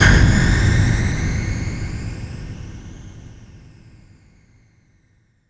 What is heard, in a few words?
electric explosion; Crash Bang Boom